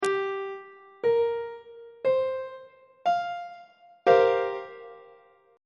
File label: c dominant 7th suspended 4th 2nd inversion on treble clef